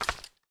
generic grip medium, weapons handling

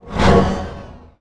spl restoration fail

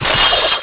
saw flesh, circularsaw hit; weapons

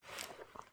generic cloth movement, weapons handling

